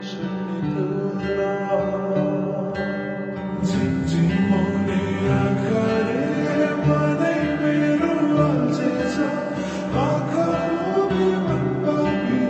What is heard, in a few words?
Ringtone Slowed Reverb; telugu; Hi Nanna